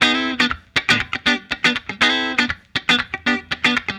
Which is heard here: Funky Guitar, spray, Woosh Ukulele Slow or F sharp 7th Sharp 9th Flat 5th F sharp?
Funky Guitar